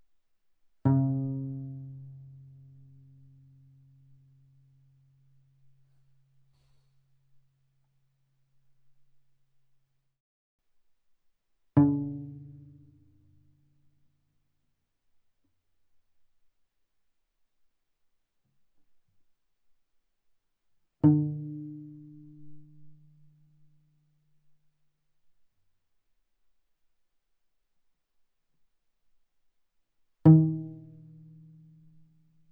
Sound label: Viola pizz sul D ff D4 B4 stereo